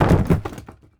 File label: town sign deploy; Rust